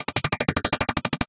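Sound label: techno pan, Loops; Distored Reality 1&2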